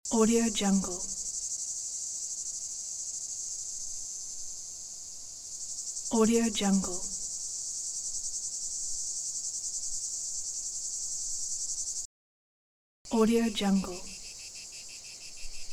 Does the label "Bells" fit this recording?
No